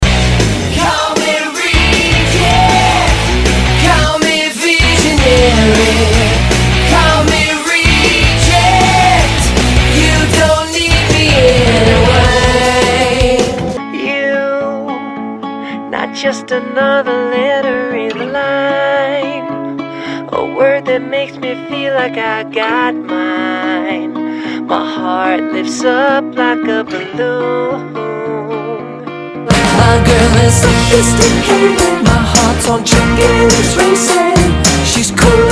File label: Singing